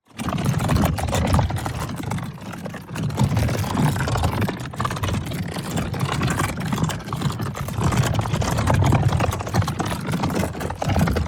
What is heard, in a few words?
TEXTURE Plastic Beast; boom library; Matter